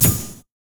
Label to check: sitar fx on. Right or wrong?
Wrong